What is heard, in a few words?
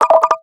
gargle, notifications; Nothing Phone (2)